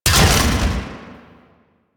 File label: Layer Shot C, Shotgun; Phantasma Prime; Revenant